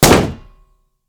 shield heavy block small

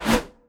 blade 1 hand swing

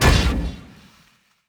illusion release

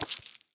spider; animal

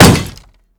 shield light block big

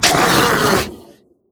draugr injured

